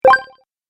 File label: Magical Token, Magic Sound Effects